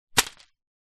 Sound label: seed pod flamboyant tree drop on ground grass; foley